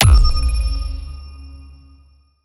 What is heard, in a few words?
ui enemy escape